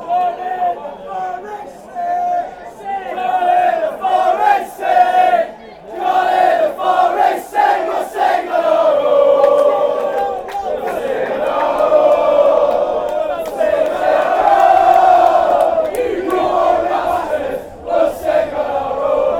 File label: cant hear forest sing, football chants